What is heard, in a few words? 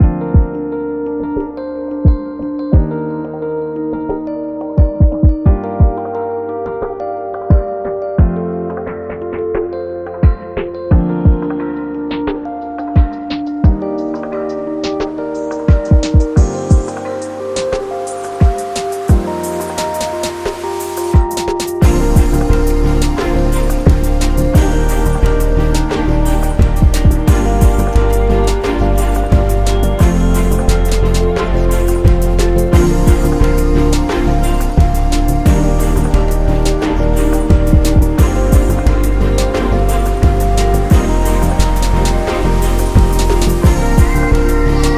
Sexy; medical background